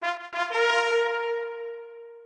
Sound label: Levelup; cube life island survival; wii u